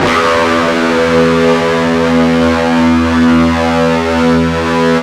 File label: FUZZ E 1, Guitar; East West; Steve Stevens Guitar Samples Collection